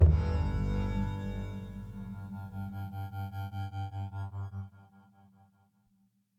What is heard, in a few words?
Music transition music segment; Music Hideout5